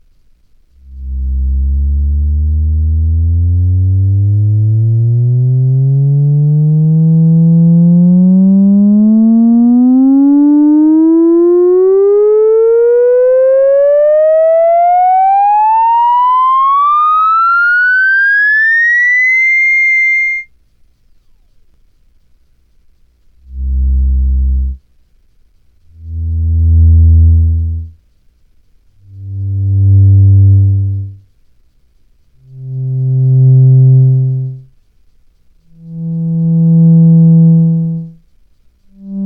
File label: theremin capture example